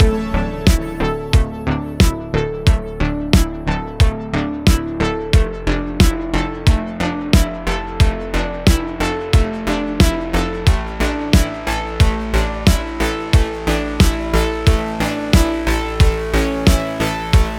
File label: Path Outro 90; Wise The Wise Path 8